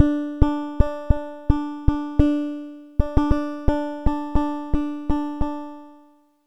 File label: hammer; waveguide modeling; WD Hammer